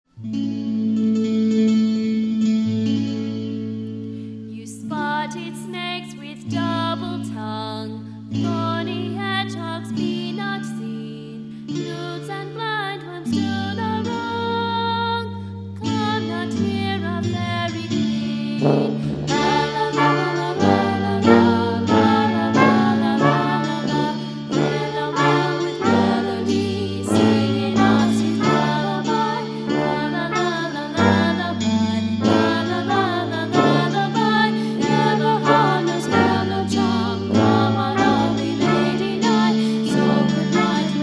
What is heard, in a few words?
lullaby